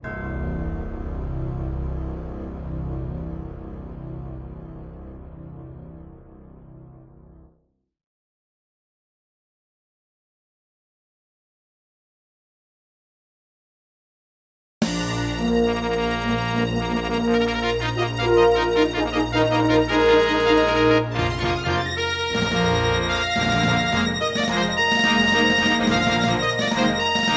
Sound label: Intro, Yamaha DD-55; Dark Forces